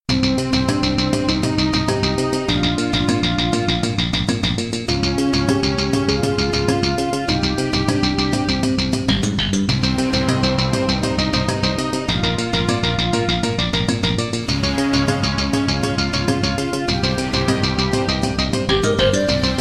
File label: Roland Edirol SD-20 GM Contemporary; Hocus Pocus